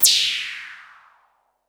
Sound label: noise fx; Masterbits; Switch FX